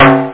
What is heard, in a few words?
percustom